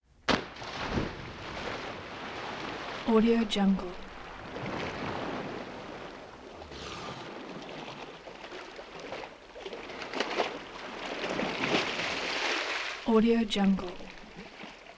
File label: boat splash water splash; game sounds; افتادن چیزی از قایق به آب